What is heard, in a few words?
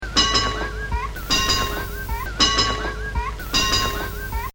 Bells